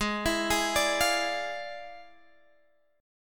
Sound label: E 7th Flat 9th G sharp, chord